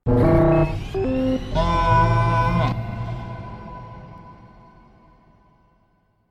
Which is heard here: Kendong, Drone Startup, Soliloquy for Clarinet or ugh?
Drone Startup